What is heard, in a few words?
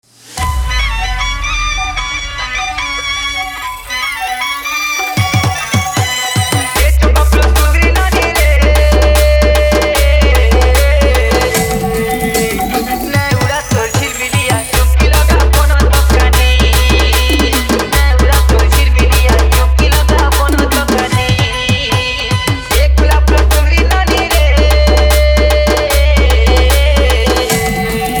Odia Ut Rhythm Mix